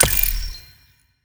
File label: atk gelecball